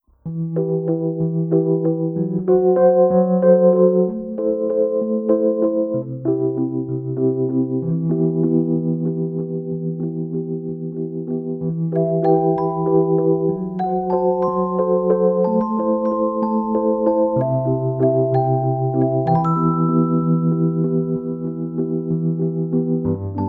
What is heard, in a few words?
Electric Piano Duets